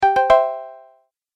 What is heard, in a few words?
Message Alert Sound, musical; Musical Sound Effects